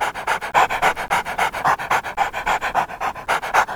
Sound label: dog panting loop; Dog Frisbee